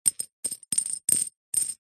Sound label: leisure game plastic small discs set down; zapsplat